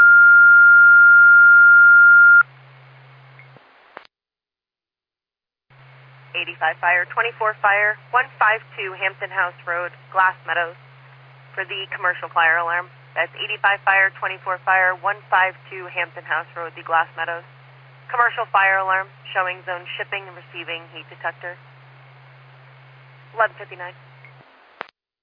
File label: Siren; Lafayette FD